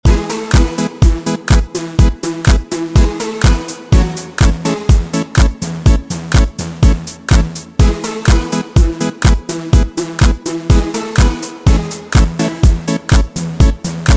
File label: Loops Dance Around